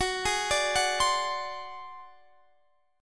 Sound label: Ab 7th Flat 5th, chord